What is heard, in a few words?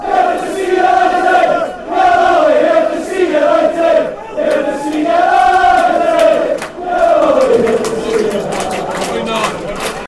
here see united fan chants